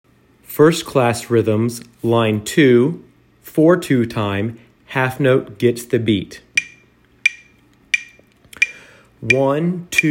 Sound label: rhythms